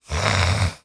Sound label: Human Male Sniffs